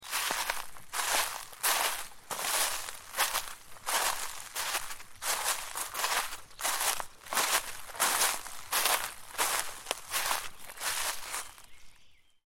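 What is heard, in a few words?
walk on leaves; human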